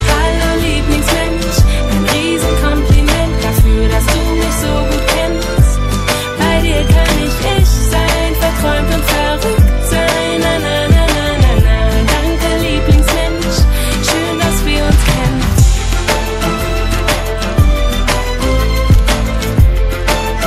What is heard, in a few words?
dutch ringtone